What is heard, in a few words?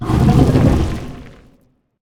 Earth Enemy Growl; AudioWizards